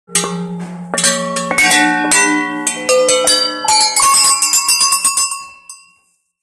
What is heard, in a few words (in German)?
Schellenklang im Museum